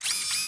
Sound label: Pinky servo; DoomRTX